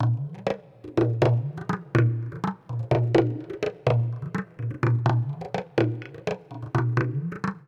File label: PERC LOOP ETHNO 125; eJay